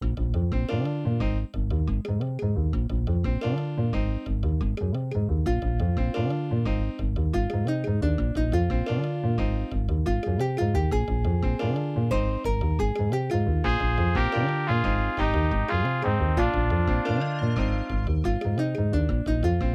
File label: acer magic s23a; Soundcard test page; Hocus Pocus